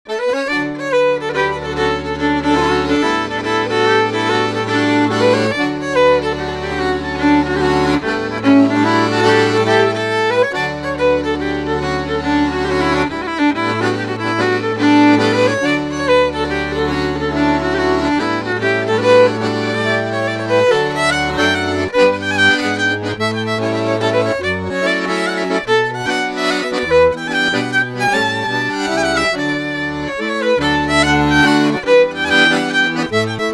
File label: marzurka; Pakkos Cafe